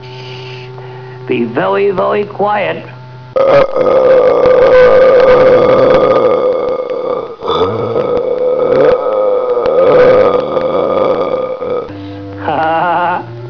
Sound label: burp